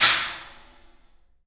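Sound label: CLUB CYMBALS; 3D Sound; 3D Drums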